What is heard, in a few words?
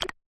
button; 2048